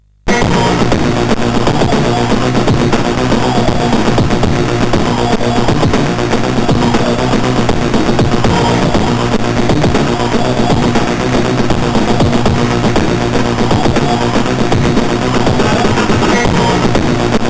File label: Funky, sid CSG8580R5; Funky Shit; Astovel